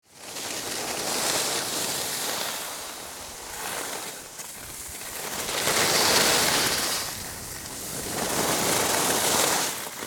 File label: Skiing ski track going down hill